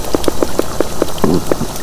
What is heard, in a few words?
catfish grunts